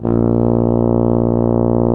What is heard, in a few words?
tuba loop note